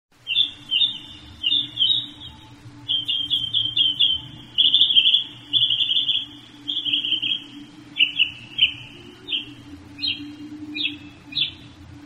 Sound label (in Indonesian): kicauan burung northern mockingbird